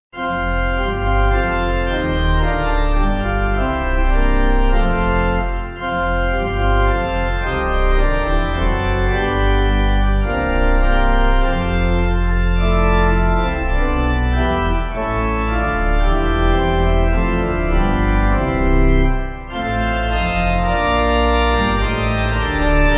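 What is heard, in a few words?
Pipe, Pipe Organ backing; All my hope on God is founded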